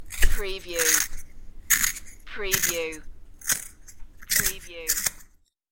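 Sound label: Sharpen Pencil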